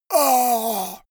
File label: monster gasp; kreaturen